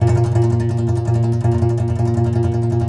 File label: C. Bass Balalaika, Plucked Strings